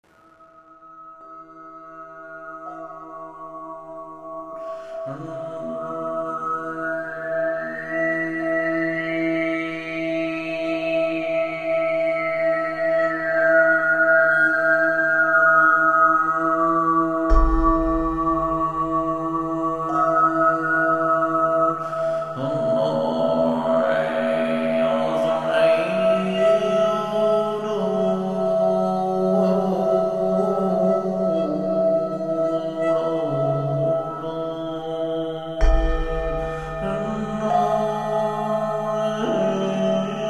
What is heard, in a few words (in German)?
Klangschalen Gesang